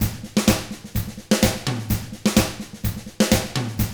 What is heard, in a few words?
127 Drums